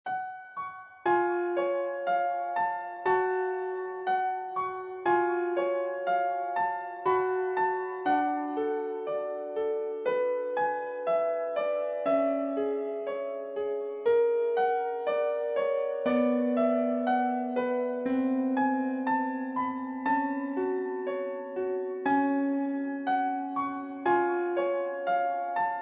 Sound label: piano